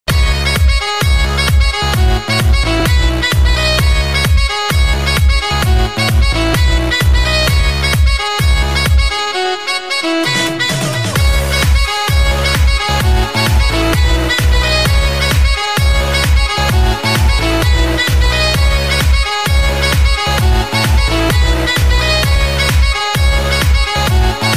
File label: Club Music